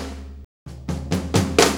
4-4 Fill, Drum Loops

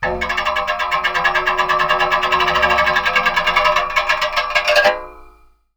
Radiator, contact mic, Metalics

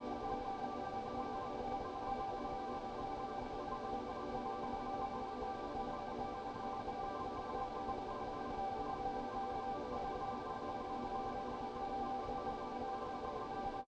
blocky garden pad em or gm

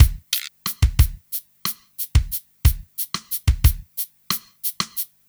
Smooth, R&B Drums; Sonic Foundry (Sony Creative Software)

